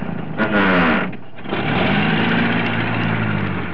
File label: cars